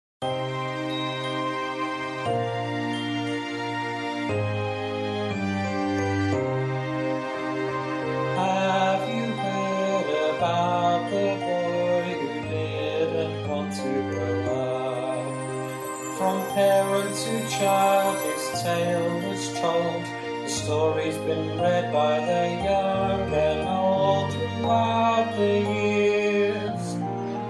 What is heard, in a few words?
Opening With vocals